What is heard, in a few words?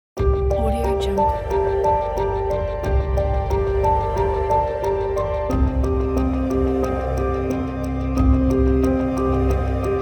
negative political ad